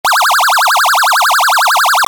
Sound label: phaser